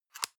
paper box small close medium; objekte

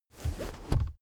car armrest backseat open fast

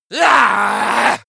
male die; soundpool

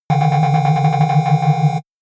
shot ringing wobble chirp

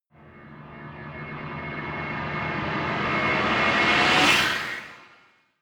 rise screech, risers

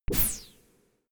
space laser gun double shot empty; krieg-waffen; soundpool

